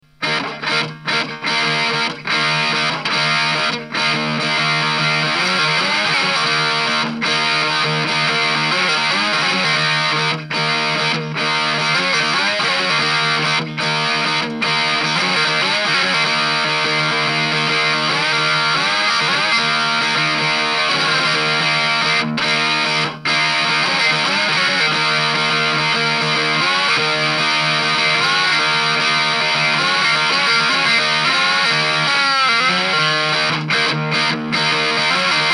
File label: tin guitar dirty; junkboxinstruments